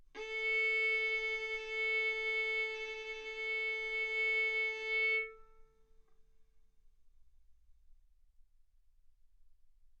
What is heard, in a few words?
Violin arco A4 B4; mf; stereo